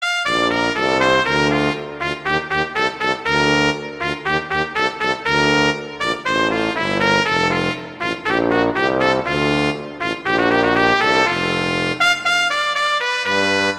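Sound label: Duet, horn; Mozart; Duets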